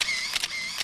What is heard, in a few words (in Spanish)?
Icono sonoro identificativo para radio; para las informaciones radiofónicas